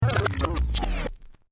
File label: Turntable Scratching Stop Brake; Dj Sound Effects; DJ (Sound Effects:Musical)